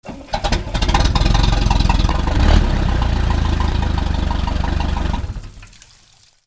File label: BOATS